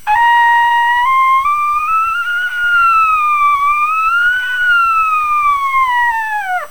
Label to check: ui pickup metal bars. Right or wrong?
Wrong